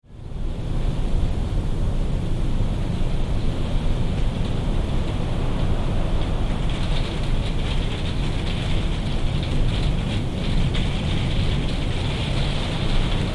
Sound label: Wind from Interior with Rain onto Windows; Some gusts; Wind Sound Effects